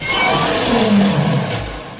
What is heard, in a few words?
metal jumpscare sound; jolly 3 chapter 1